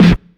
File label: clean snare f key, snare tuned to the F note, Loudest frequency: 487Hz; snare-drum-samples